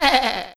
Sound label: sheep hit